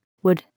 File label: wood